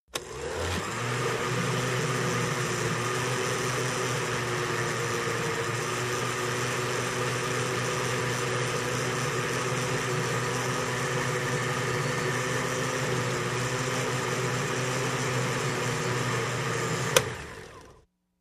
Blender Slw On Run Off, appliances

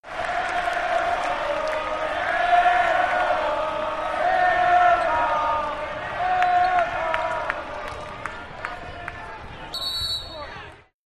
Basketball Air Ball, sports arena

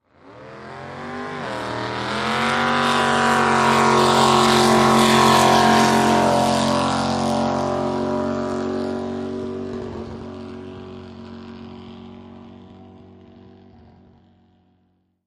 Drag Boat Start by

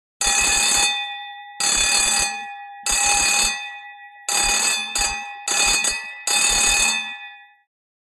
Bell Ambulance, bells

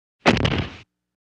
Body Fall Short

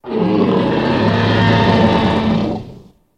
Dinosaur Roar Strt Lo

